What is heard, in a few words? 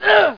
throw